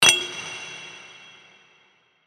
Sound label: heavy metal hit